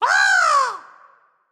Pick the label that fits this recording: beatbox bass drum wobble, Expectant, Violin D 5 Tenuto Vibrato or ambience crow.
ambience crow